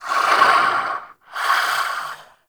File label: Vocal Breathe; Warframe; Duviri; Dax